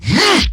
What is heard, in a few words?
Melee Attack; New War; Erra